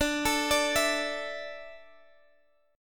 D Suspended 2nd D down Guitar Half Step Down, chord